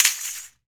RAKATA, Afro Shakers, Ankle Pods; Roland LCDP14 Africa VOL-2

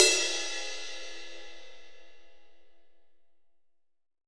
ZIL22, JAZZ RIDE CYMBAL

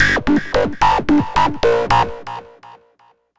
Reso Funk; Dr. Fink's Funk Factory

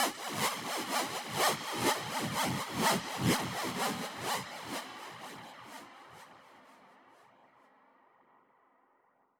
Verb Proc C, alarm siren, 125bpm; musicradar

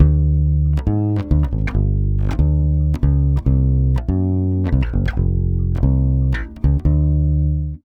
BASS GROOVE, Brazil Bossa; USB Soundscan vol.16

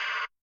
Amb Hit; Yamaha DTXpress-II

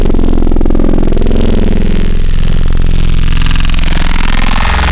Reese, Serum Wavetables; Antidote Advent

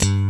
SLAP BASS; Pro-Rec - Synthsonic; BASSES

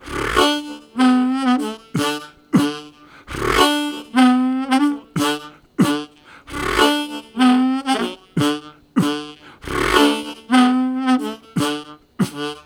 Low, Harmonica; Zero G

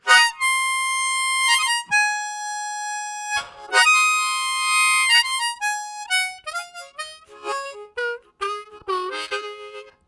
High Squeeze, Harmonica Riffs; Zero G - Harmonica

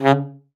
sax c#3, Saxophone stacc piano; Session Instruments; Best Service ProSamples vol.42

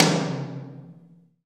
TOM TOM

